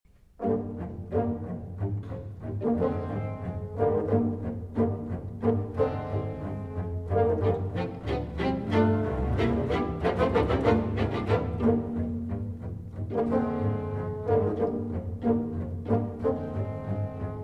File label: Concerto In E Flat For Chamber Orchestra, Con Moto